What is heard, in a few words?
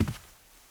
carpet step, Footsteps